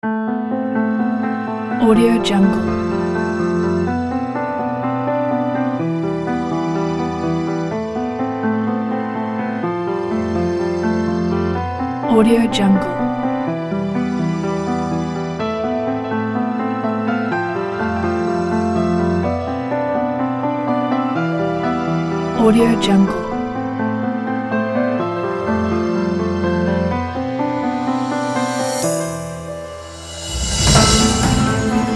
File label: melancholic cinematic music